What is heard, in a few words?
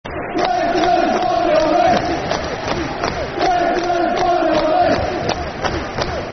Football Chant, Soccer Songs; Turner's Barmy Army; Hereford United Hufc